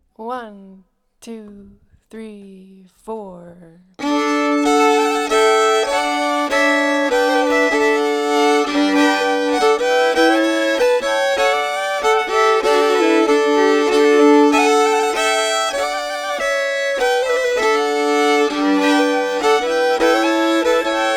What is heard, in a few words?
unsetholmen ganglat melody plus harmony, fiddle